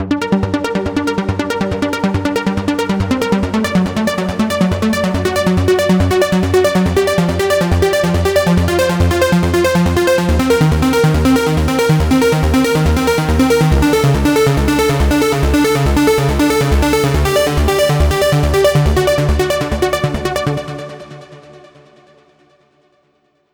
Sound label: arpeggio alone